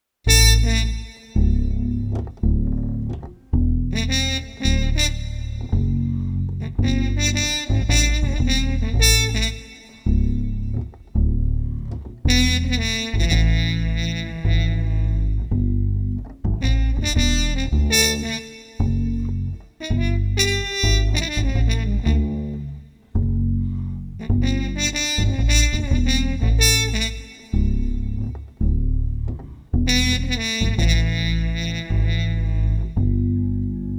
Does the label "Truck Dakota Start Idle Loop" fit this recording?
No